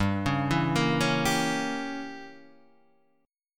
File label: G Diminished 7th, Guitar, Standard; arpeggiated; JGuitar